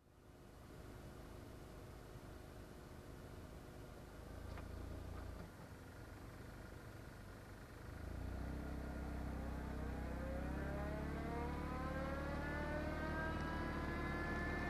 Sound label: Car reversing and stopping nearby